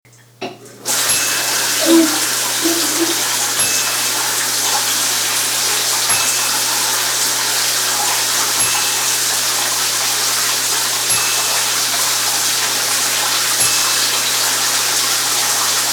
Shower

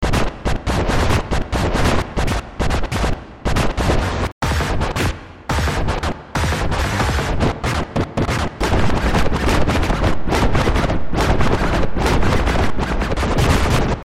Scratching in the Hall

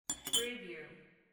coffee spoon

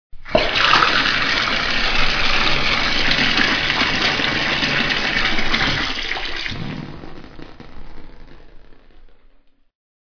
Bath Water

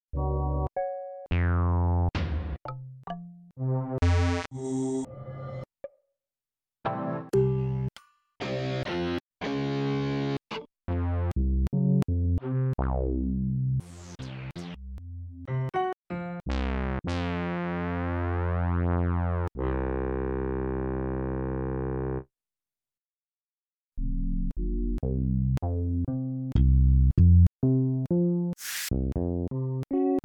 triton; triton extream

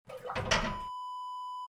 sauna ladle set down

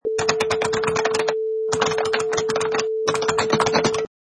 Typing on Computer Keyboard Short

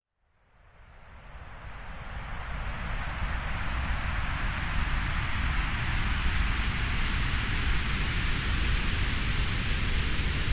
Sweeping Grey Noise; One Hour

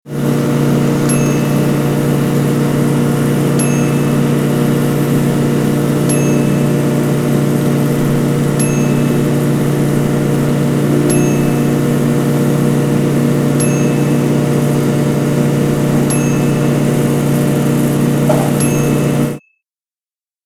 Riding Lawnmower Mowing

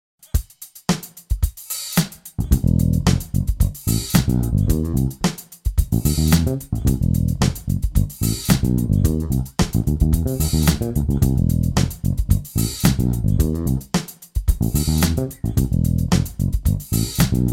16th note groove in C7

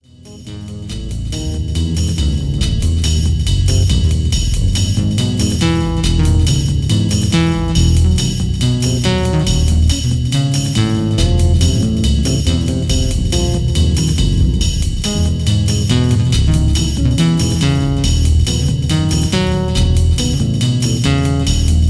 Swinging Synth

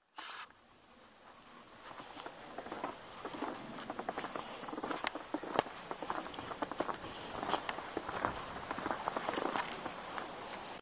Snowboots

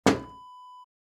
window knock

